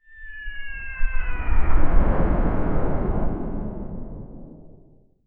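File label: a ballistic missile flying, flyby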